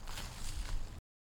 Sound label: Step On Dead Leaf